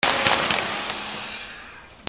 INDUSTRY

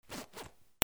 RIPPING

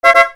CARTOON

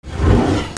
HOUSE

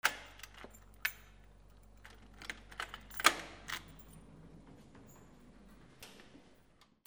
DOORS

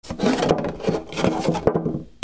GARAGE

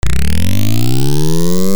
ALARMS

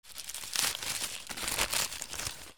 PAPER

